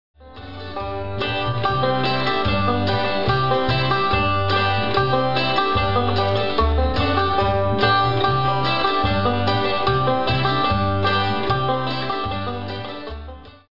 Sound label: banjo in the hollow